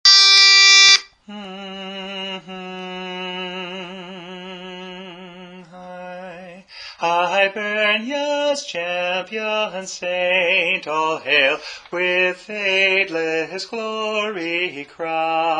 Hibernia's Champion Saint, All Hail (Tenor)

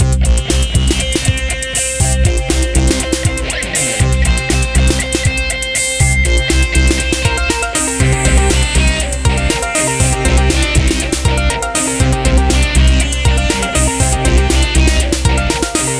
Music

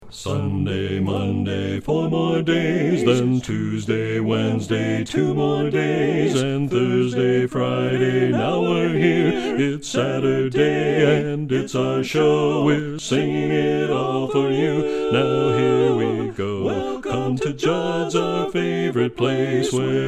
bass predominate

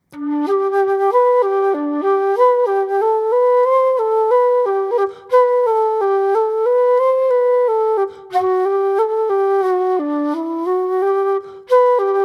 Slowest; Sheehans

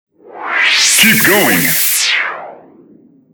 Air Med

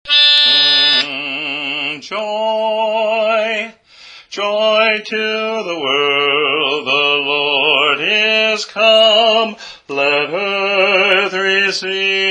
Joy to the World (Bass)

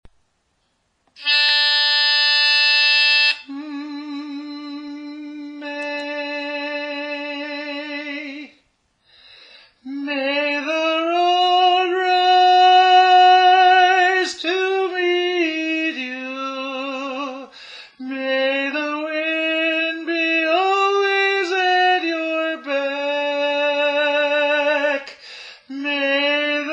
An Irish Blessing - Tenor

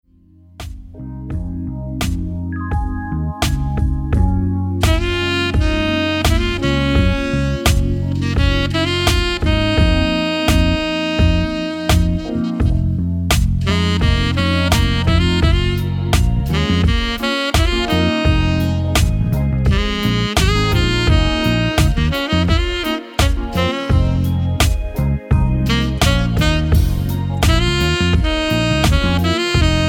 With Sax

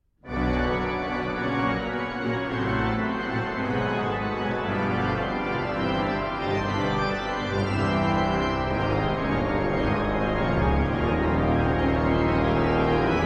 Toccata for Organ

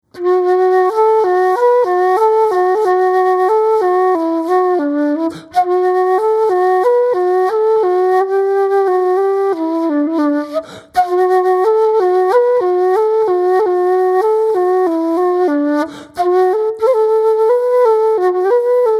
Slowest

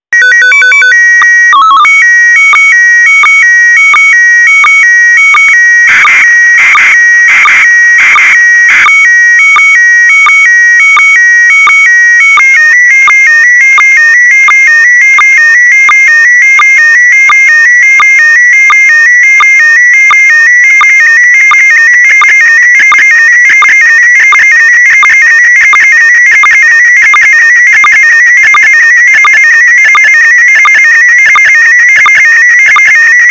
SSTV OVER FM

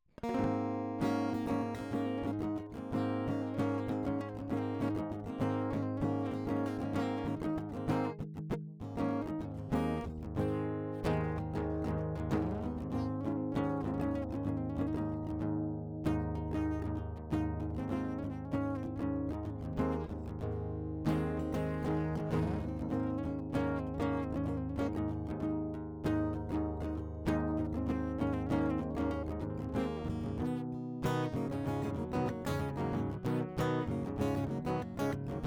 AC GTR DI; 97 Percent Fire Studio

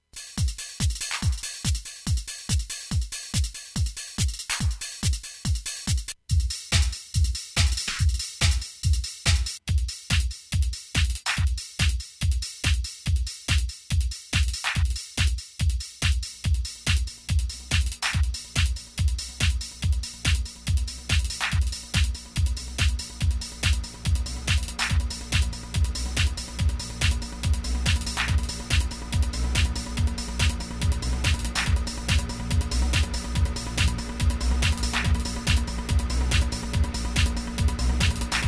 techno

